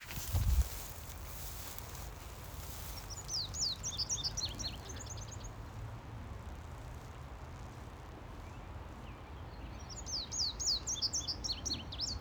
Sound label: indigo bunting